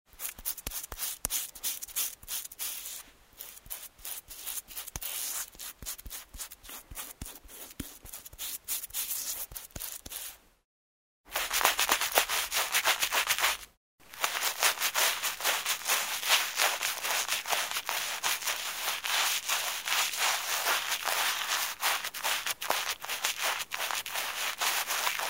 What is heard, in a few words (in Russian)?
kistochka i britva